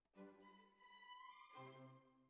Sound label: Flute